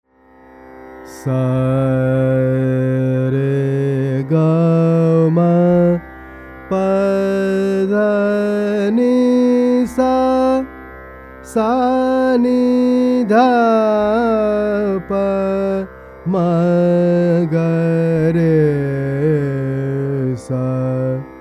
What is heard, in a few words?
raga Bhairav